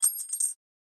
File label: other player cash out